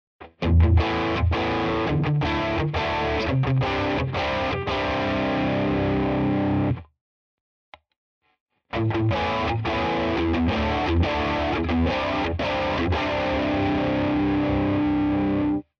Flanger crunch bypass; AxisFlanger

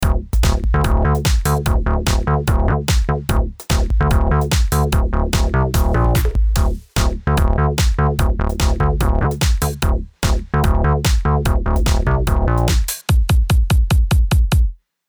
Tierra Audio Gravity VCA groove hpf atk fast rel

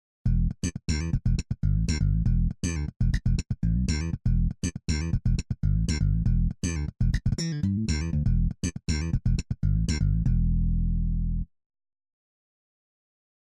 Slap Bass

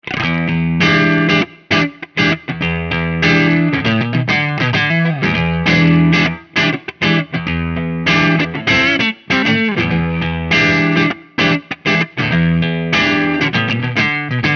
Mooer Preamp Model X US Drive Ext Cab Sim

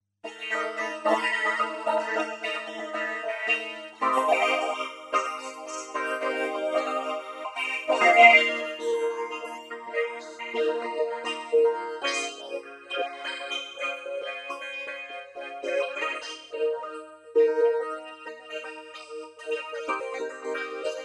music band

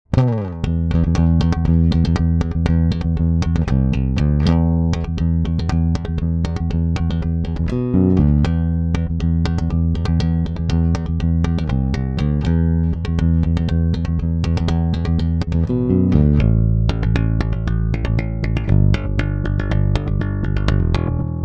active rolloff bassboost

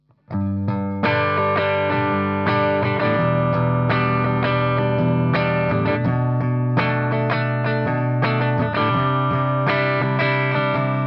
Clean

